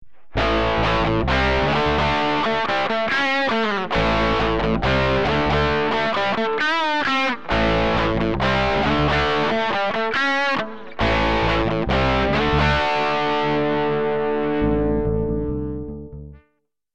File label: jingle